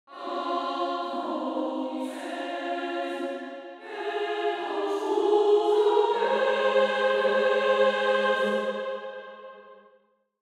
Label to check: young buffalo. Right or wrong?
Wrong